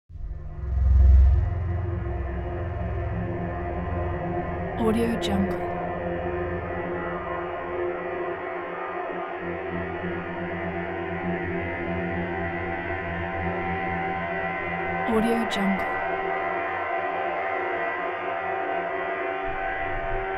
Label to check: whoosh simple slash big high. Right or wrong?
Wrong